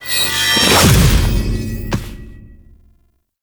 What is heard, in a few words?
riposte 2H nikana finisher foley; Enemies; Drifter; Warframe; Duviri; Dax